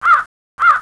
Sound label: crow